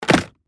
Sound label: Puzzle game tile swap; video game